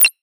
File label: Modern Sci-Fi Glassy UI